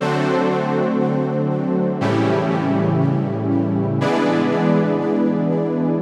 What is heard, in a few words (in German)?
c dur nebenakkorde